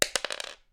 Bullet Drop Wood; Stuart Duffield; Bullet SFX

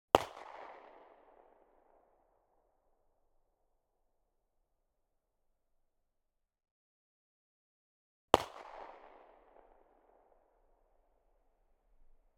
Glock 17 - FIRING - 20m In Front; MKH8020

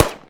shoot, turret floor; hl2

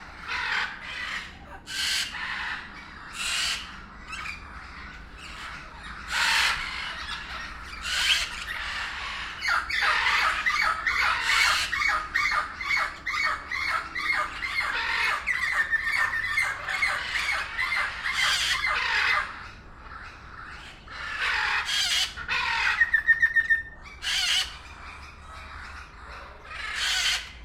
Aviary, Zoo

